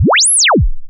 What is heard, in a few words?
Laser Zap; Dance; SFX One Shots